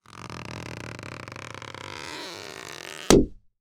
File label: Cartoon Balloon Rubbing Pop